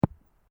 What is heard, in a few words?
Sport Ball Rubber Bounce Grass; Bounces; Objects